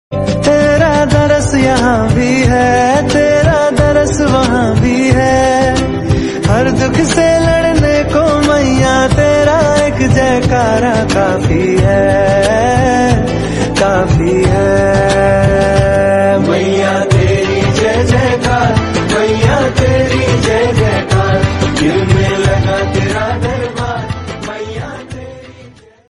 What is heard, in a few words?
bhakti ringtone; maa durga ringtone; navratri ringtone